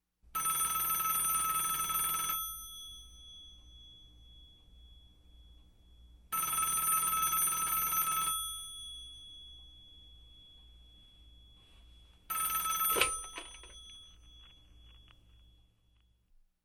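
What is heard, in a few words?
phone ringing, picking up the receiver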